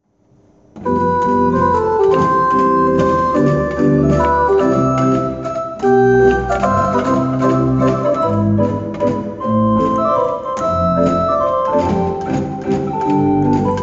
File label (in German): Volkstueml Polka-Mazurka